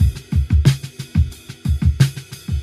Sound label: Drum loops, Funky